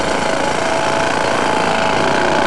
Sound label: Jack Hammer